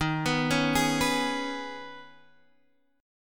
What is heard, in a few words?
Eb 7th Suspended 4th Sharp 5th